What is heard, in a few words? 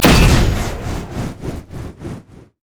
thermal charge; noxian; LoL; urgot